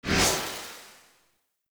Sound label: UI Theme Open; Zephyr